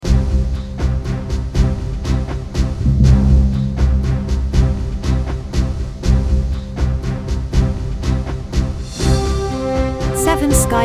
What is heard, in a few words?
Heroic